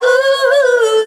"ooh" SUNG; Voice

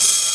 Hats Electro Open

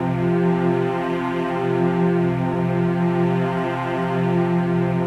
autumn thunder, String

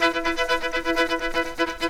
strings frantic, Sad; Loops

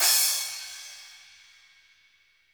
Hats Real Open

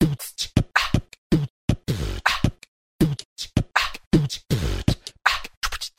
chwychick, Beatbox; Drum loops

